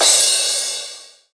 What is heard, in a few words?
80s Crash